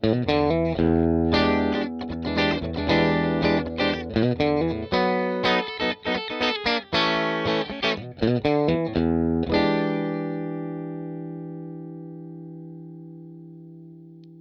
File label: Clean G50 9m, Clean G30 Cable Tone 9m; Line 6 Relay; wireless pentru chitara de la Line 6